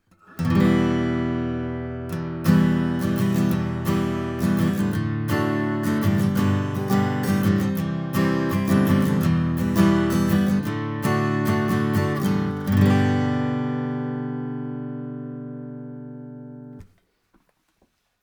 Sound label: Sanken CUX 100K Niere far Acoustic Git 30cm